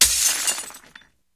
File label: icicle melt; tf